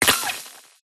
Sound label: sparkly short glitch; PMSFX